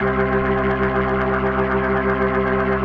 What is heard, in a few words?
C2, B3